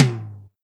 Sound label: TOM, Eurotech; Sounds Good